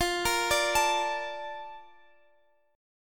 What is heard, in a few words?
Bb Major 7th, F